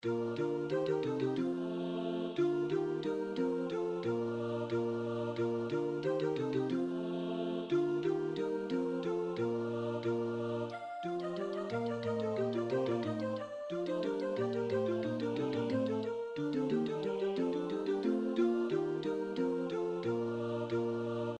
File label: christmas dingdong, midi